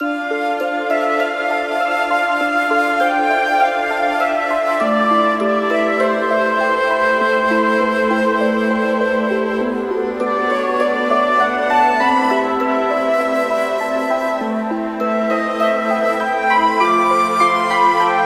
Frozen Forest loop

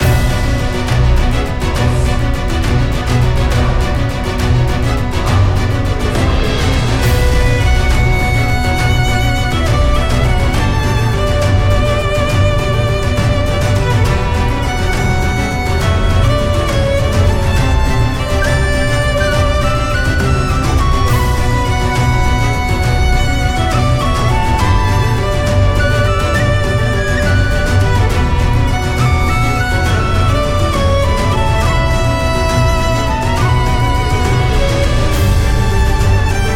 Epic Battle Deity loop

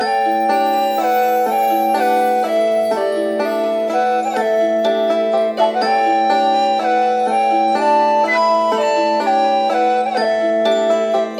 Snowy Village loop

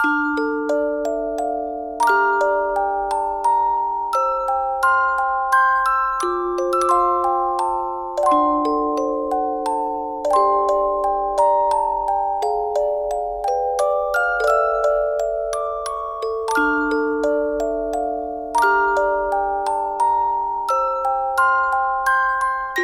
Music-box Sad loop

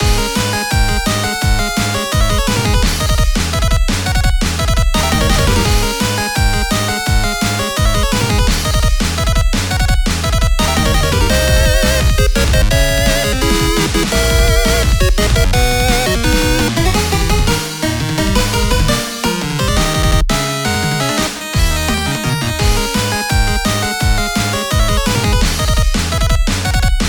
8bit RPG Battle loop

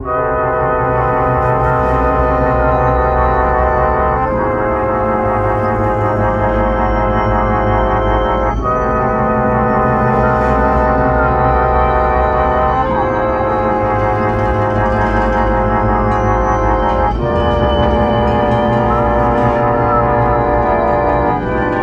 Scary loop

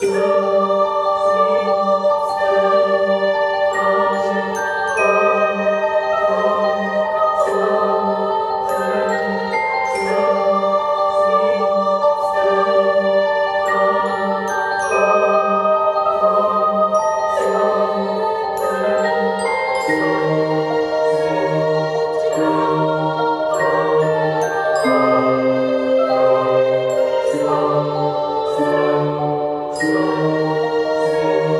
Scene Tragic Celesta loop

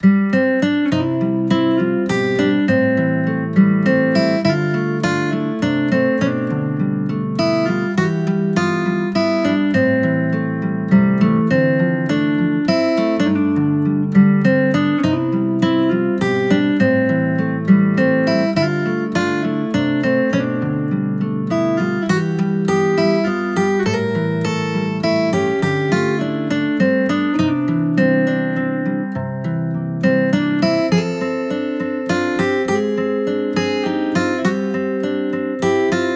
Gentle Theme Guitar loop

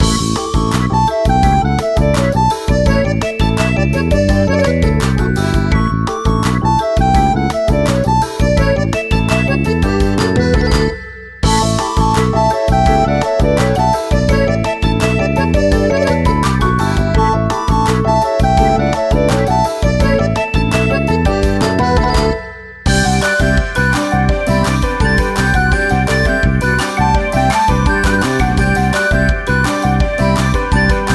Positive loop

